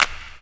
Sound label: CLP HOP; E-Lab; X Static Goldmine 2; akai